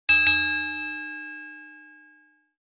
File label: boxing bell hit double